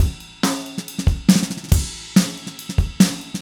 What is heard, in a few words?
Acoustic Kit, Drums & Percussion; Electronic Point Blank